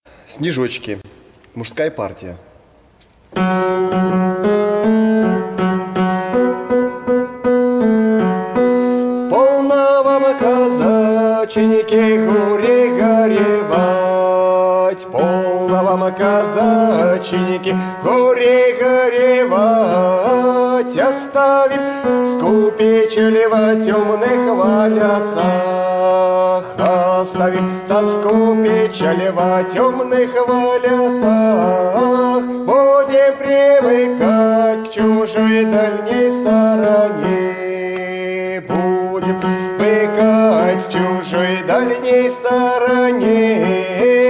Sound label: Snezhochki muzhskoy